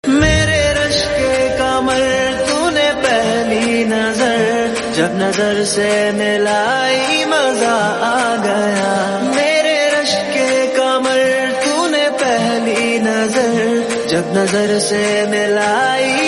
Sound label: hindi rock song